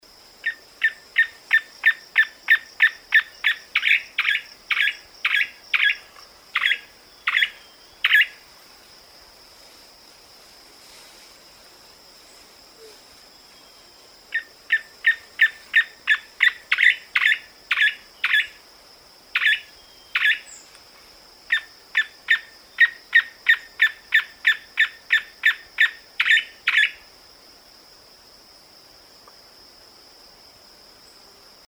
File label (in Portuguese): papa formiga pardo Formicivora grisea rufiventris